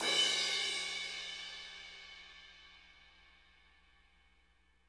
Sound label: crash, crashes